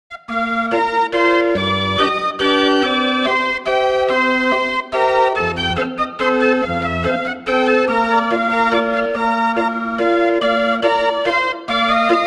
Keyboard Instrument; Assassin's Creed Syndicate

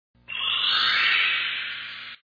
Star Swish; Super Mario 63

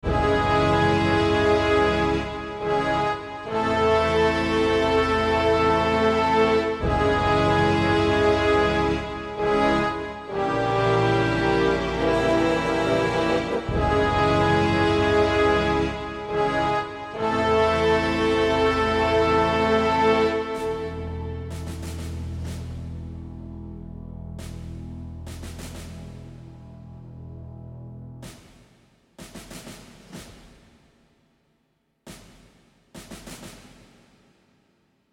Russian Defeat

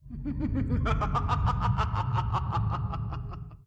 groan

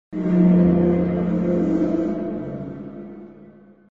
attack; Necronomicon: Book of Dead Names Online

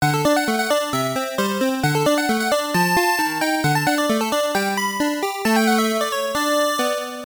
Game Clear; mikie arcade

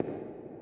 footsteps generic

